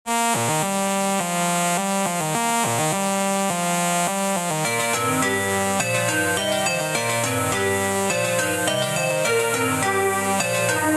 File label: Phonk Funk